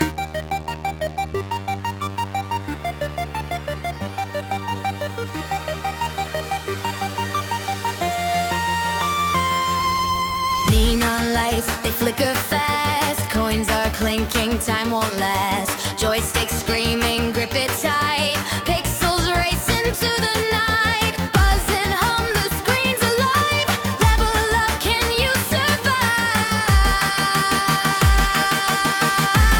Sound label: BackgroundMusic